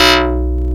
PPG MTL PERC; Greytsounds VOL-1

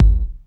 BD, Dance Mega Drums, HIP; 90 s Sample CDs; Best Service

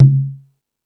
Latin Drums, E-MU Pro-Cussion

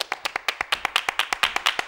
MULTCLAP, MULTICLAPS; Best Service; World Colours

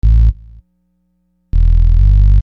Hard Synth Bass; Construction Kit; HIP-HOP; Sonic Foundry (Sony Creative Software)

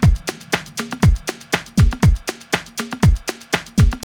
house loop

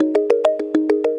Tongue Mallet Echo; Melodic; Ambient-Melodics-II

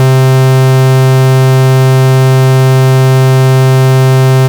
Oscillator Square C2; radiosonics; Vintage Oscillator

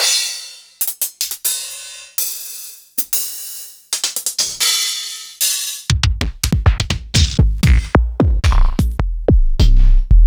Ensoniq ASR-X

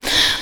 BIG BREATH; USB Soundscan vol.18; AKAI; Funky Vocals

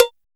TITE cowbell

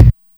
DPM48 BD, Sakata DPM 48; classic machines

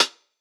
Acousti Hat Hit, hihat; ultimate hihat; Hits

